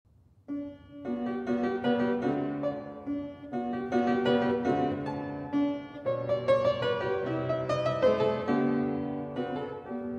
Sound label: German dance; haydn joseph